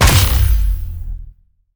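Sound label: naginata, player impact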